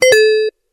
eight bit door bell

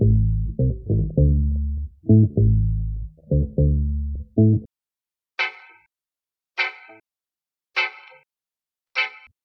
guitar, loops; Phonky

